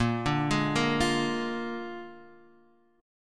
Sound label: A sharp 7th A sharp, Guitar, Half Step Down